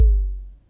SYNCUSSION

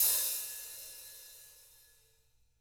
HT RA OPEN, Drums; DW; BRUSH

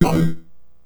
Synth Hit E; Trance; Synth Hits; Zero-G Trance Inducer; 90 s Sample CDs

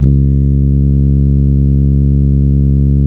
C#, Synclav FM, BRASS; Big Fish Audio

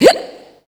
Dance Vocals, FEMALE

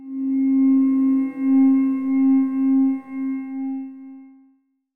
PAD - C; Instrument Oneshots; 99Sounds; Monosounds

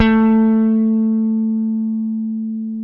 JAZZ GT1A2; AKAI S-Series CD-ROM Sound Library VOL-8

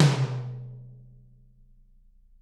TT, Toms; Bob Clearmountain Drums I

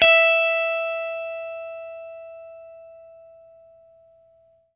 FENDER HRD, Guitar & Bass; Quantum Leap

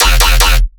bass hit knock knock E; Virtual Riot

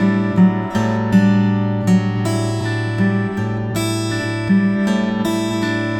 Acoust, Guitar

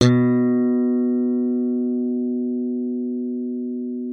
thumb G B2, slap thumb; Xsample VOL-1; 90 s Sample CDs

